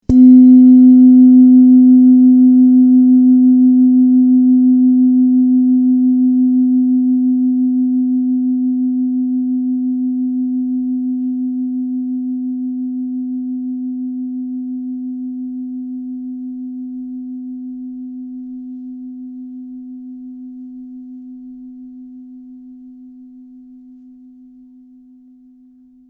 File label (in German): klangschale sonne